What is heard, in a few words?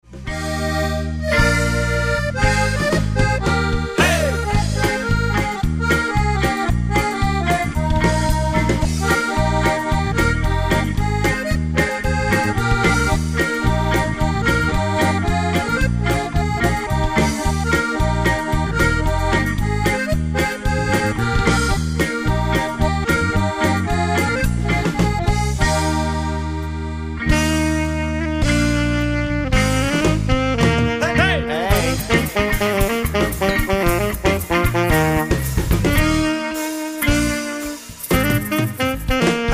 Polka